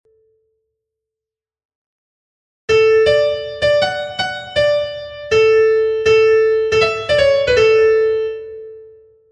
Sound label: piano; trout